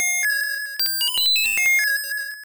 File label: Hypnosis; Pokemon; Gen 1; attack moves; RBY